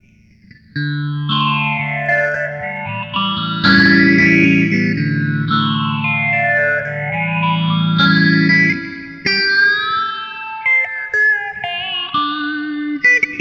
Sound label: Bi Phaser, Boss GT-8